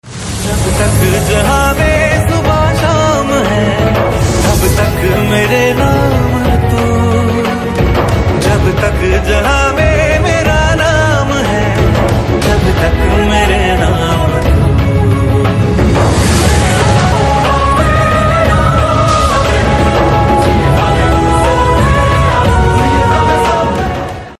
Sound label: telugu tone